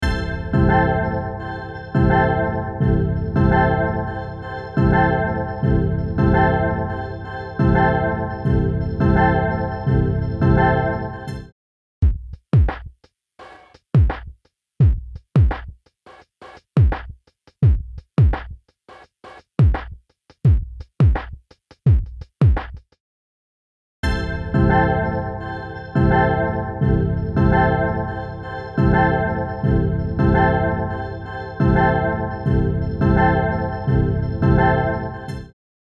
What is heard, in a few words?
Drum Loop All; 開始時とテイルの両方に作用; Temperance Pro